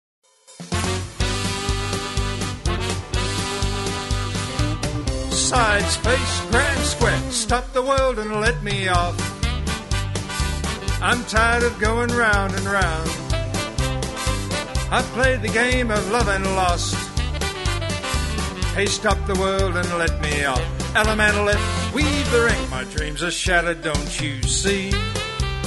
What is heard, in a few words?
vocal